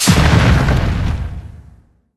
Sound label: Tank Groundslam Impact; Hero